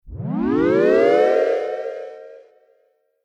Reveal SciFi Magical Pass By, Reveal Cartoon Magical Ascend Pass By Transition; Mystical; Whooshes (Sound Effects:Sound Design)